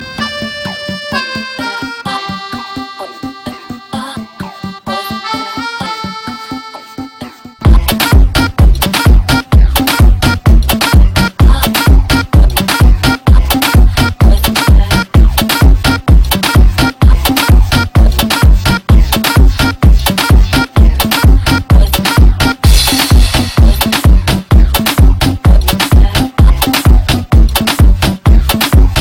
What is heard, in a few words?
Crazy Akordeon